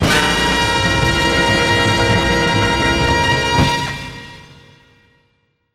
Brass and Drum Tag in Bb

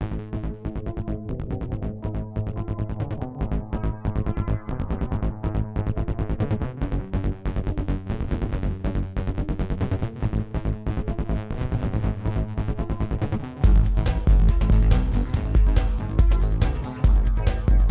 Guitar in Space; Spaghetti

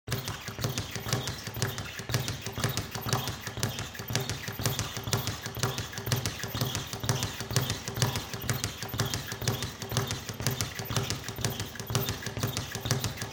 BOXING GYMNASIUM

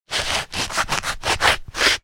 Clothes Boot Construction Scrape Concrete

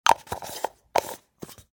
JAR PLASTIC

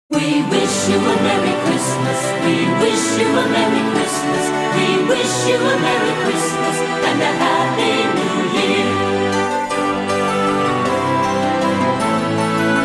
We Wish You A Merry Christmas

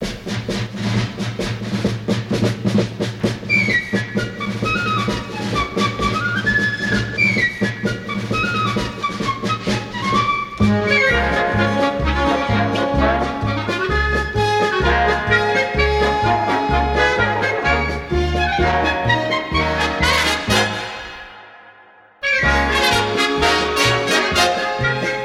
Movie Theme with Fife and Drum Intro

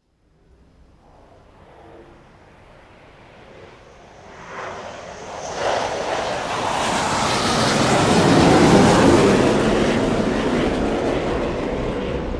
AIRCRAFT CARRIER

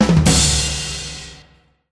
Rim Shot with Crash

